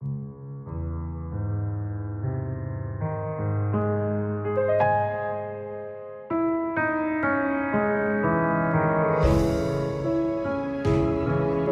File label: piano Tape Felt; Zauberpiano; Decent Sampler